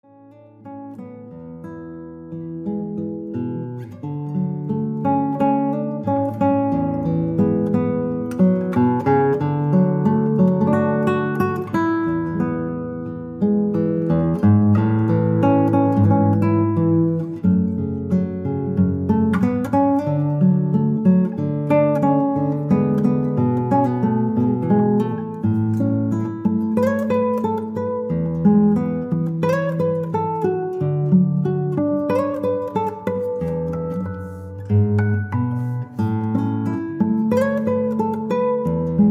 Solo Guitar

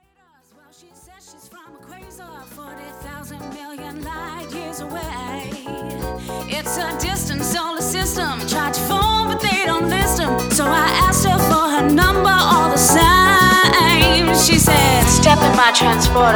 Soul Beat, Live Soul & Motown Band; Soul Beats

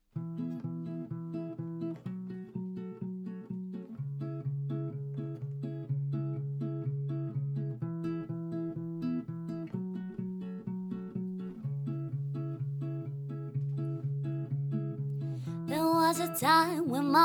Live Lounge

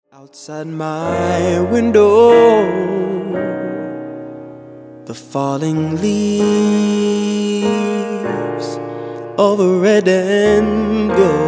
Acoustic, Acoustic Male Singer

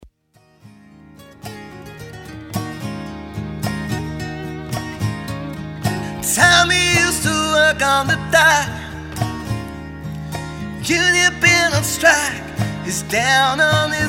Live Lounge, unplugged mix; Hi DEF Live Lounge; HD Acoustic

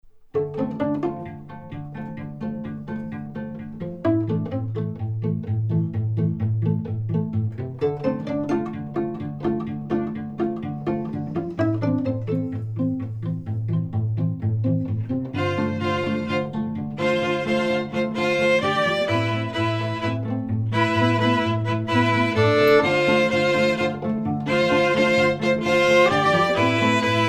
Electric Strings; Sahara Strings

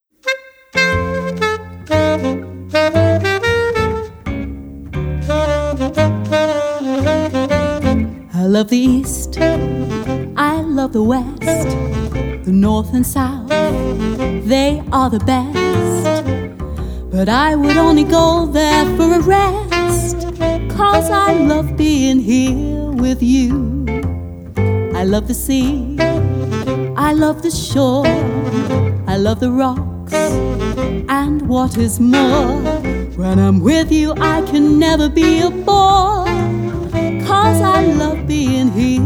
Jazz Band

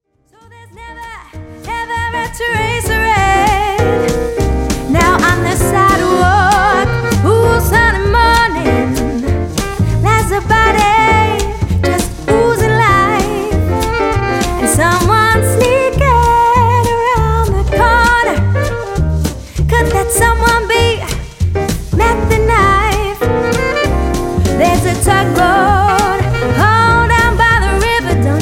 Swing, Jazz, Stylish Singer; Swing and Jazz Show

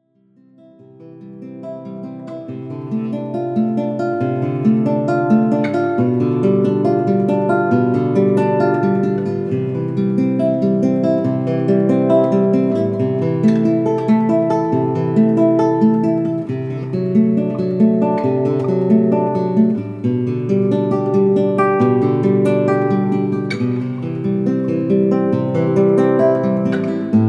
Solo Guitar

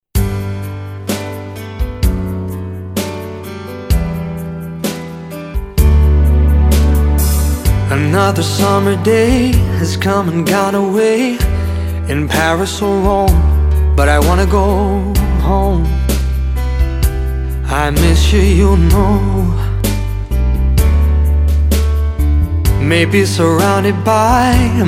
Swing Singer

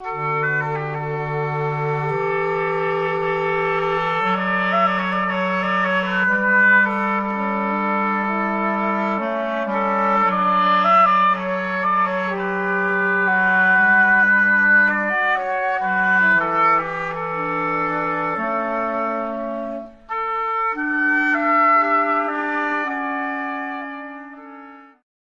Oboe

